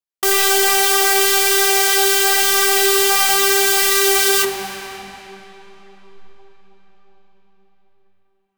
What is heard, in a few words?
one shot distorted upbeat, house